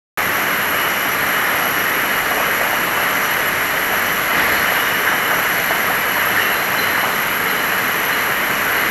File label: ultrasound leakage control; metec